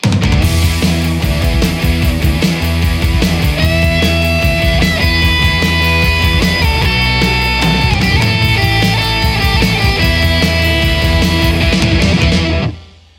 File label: kuassa creme coolmidamp lespaul, ampsims